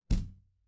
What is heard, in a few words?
impact thud